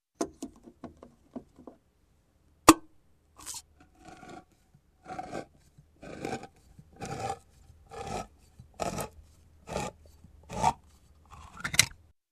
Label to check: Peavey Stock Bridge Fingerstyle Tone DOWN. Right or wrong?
Wrong